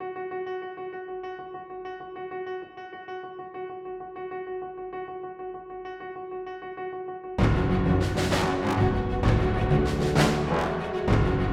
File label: gritty action theme